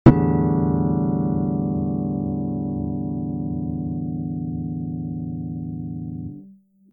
Electric guitar hit on strings

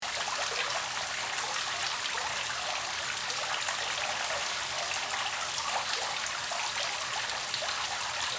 Shower running inside moderate water pressure

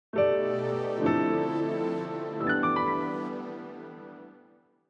Elegant logo intro

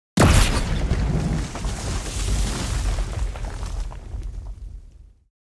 Sci-fi weapon proton bomb explosion

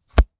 Solid martial arts or karate blow

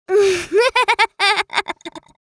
Girl child laughing

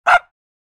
Small dog single bark

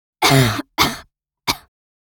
Teenage girl coughing heavily

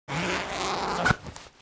CD and DVD case with zipper opening closing

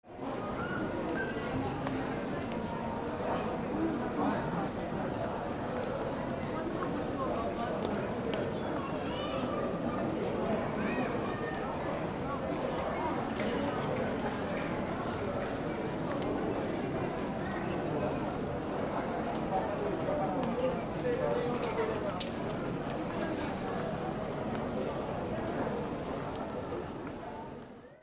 Airport hall light voices and passing by

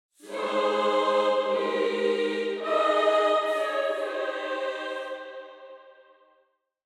Angelic choir angels; shine with righteousness

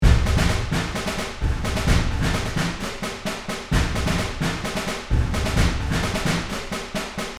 Field drum marching loop

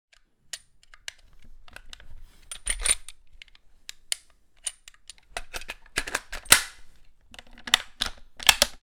Sniper rifle handling foley movement

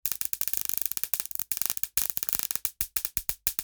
Electrical zaps electricity sparks; sound design effects

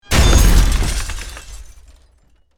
Car crash impact

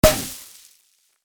Celebration pop up

